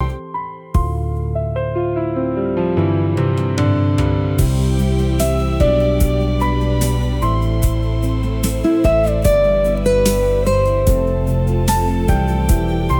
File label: loop